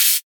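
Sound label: open hi-hat, Drums; HiHats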